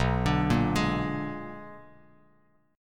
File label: B-Minor Major 13th, Bass Standard; BmM13; arpeggiated; 7,17,8,13; JGuitar